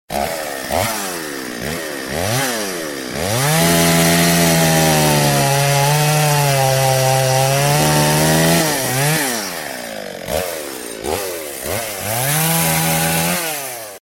Chainsaw

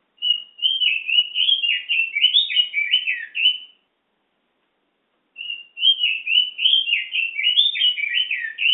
Pekin Robin Bird

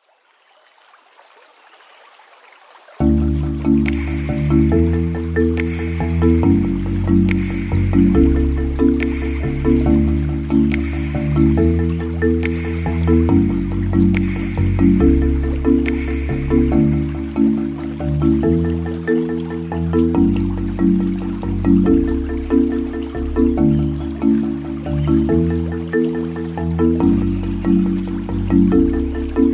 Soothing

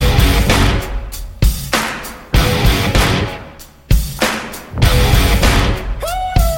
Rockstar Guitar

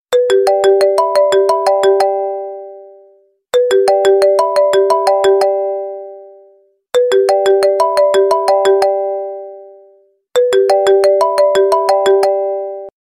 Rings

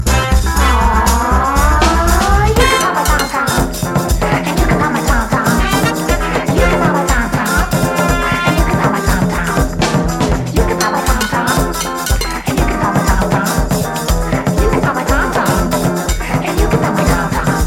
Pound My Tom-tom

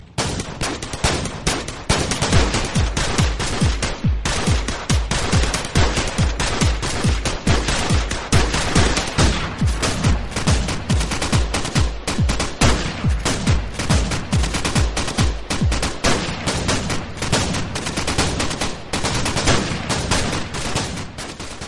Weapon Sound Mix

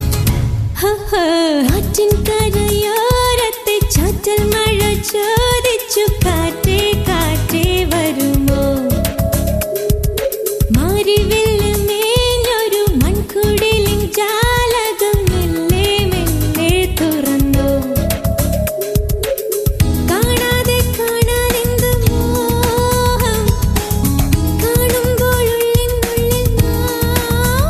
Tamil Song

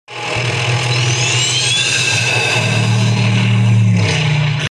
Supercharger; Mad Max

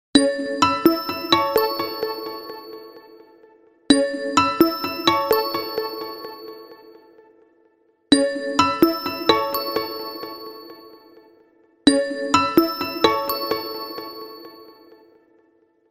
Alcatel ripple

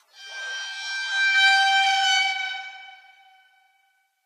Dramatic Squeek

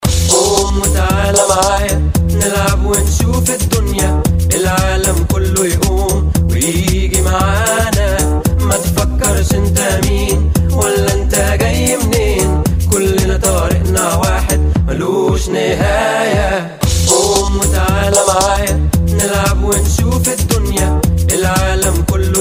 Arabic Dub Mix Arabi

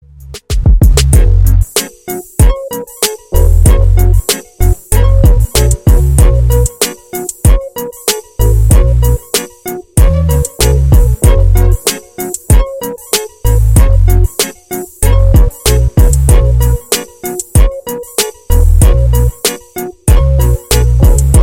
Beats

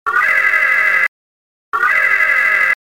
Klaxon; Star Trek

